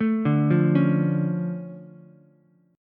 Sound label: D Minor Major 7th, D, Ukulele; DmM7 arpeggiated; JGuitar